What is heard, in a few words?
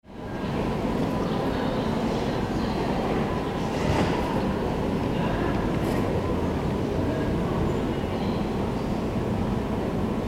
railway station medium hall and birds